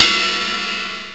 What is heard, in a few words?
RIDE CYM; CYMBALS; M8; CMI Factory